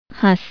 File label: laugh